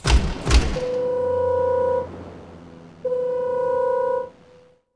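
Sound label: lighthouse, buildings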